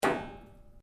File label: RUBBER ON METAL; Sound Effects:Materials